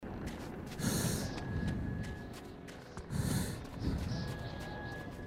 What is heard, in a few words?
outside sound; Metro 2033; gamesoundopedia